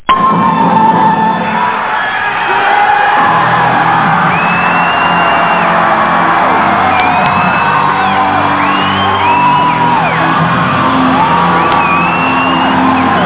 metalic

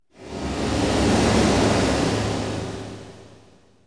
PIPES, pipes; zones

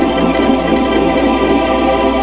Splat Note

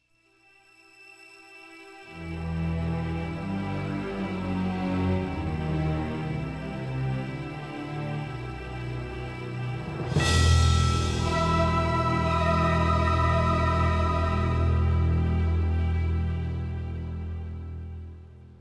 music defeat; wh40k

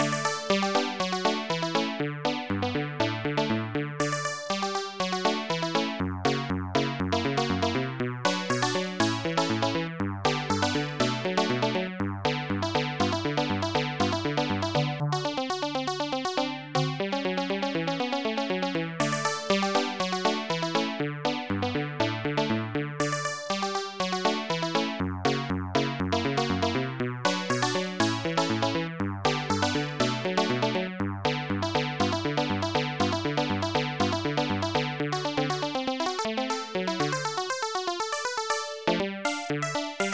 THE CLAP

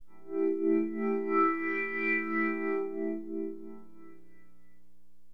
glass, keyboards; HipHop; LiveAct Pool 1

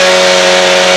audi engine; WRECKAGE

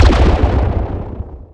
beam

